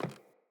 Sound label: Sandals Run Wood, Footsteps; Player